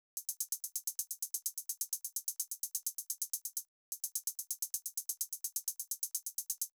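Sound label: Drum Loop 128BPM hi-hat 16tel; VEC5 Drumloops; VEC5 Multi Loops 128BPM; Loop Elements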